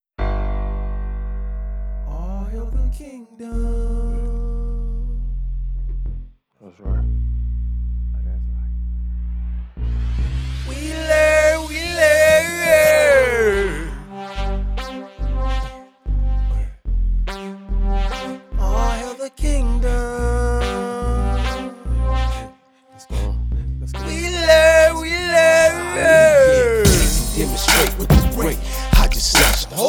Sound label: Music